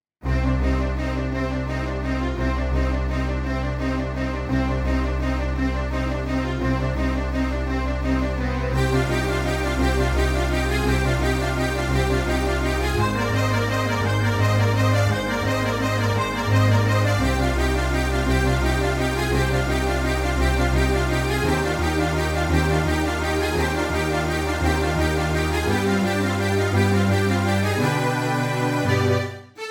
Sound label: Strings